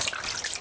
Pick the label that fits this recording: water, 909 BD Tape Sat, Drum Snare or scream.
water